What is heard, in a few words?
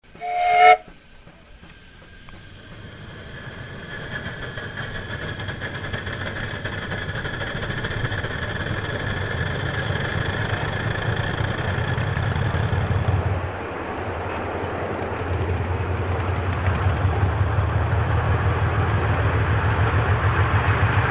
Starting, live steaming